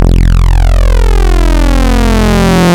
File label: Quad Saw; Ableton Live 12 Lite; Vector; Sprites